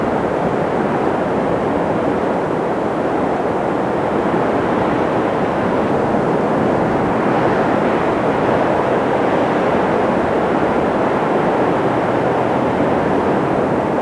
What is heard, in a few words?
desert wind; WAR